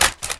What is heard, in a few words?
mgun mag out